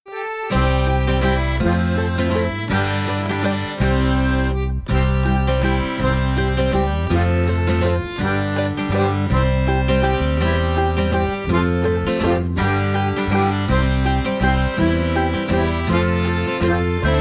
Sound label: Sea Shanty